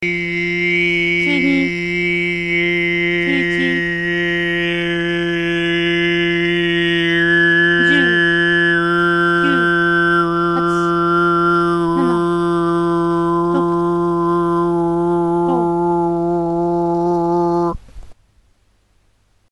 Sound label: hoomii; baion